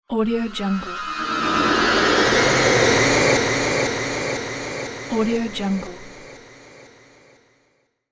somewhere mystery transition

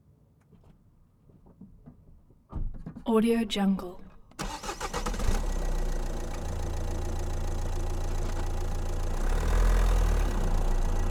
car driving short engine

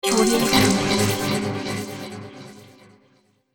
science fiction game fail

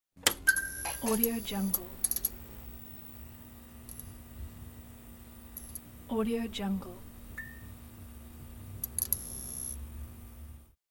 neon lights

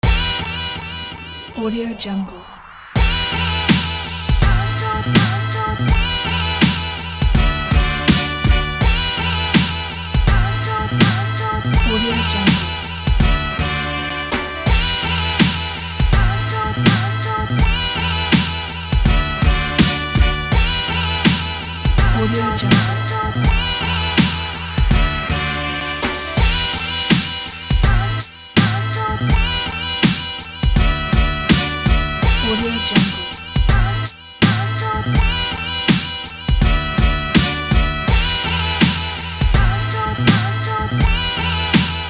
real hip hop

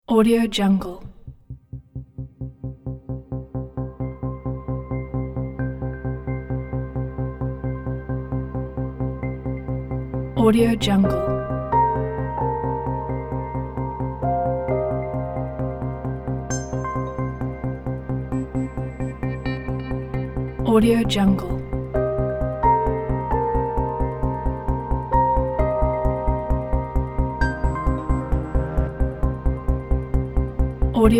cinematic scifi ambient crescendo

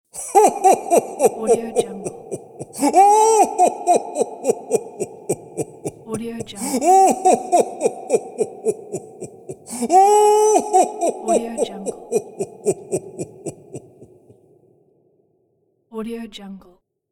santa ho ho ho laugh with reverb

